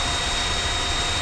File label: plane; mcheli